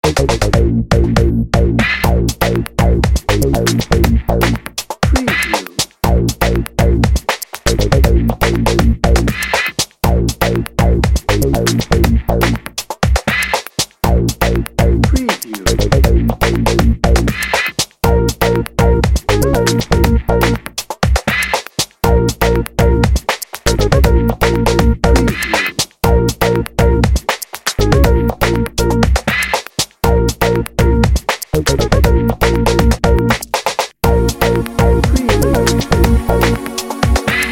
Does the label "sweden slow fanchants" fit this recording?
No